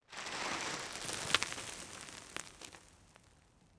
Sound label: sand debris; World of Tanks Blitz; ambient italy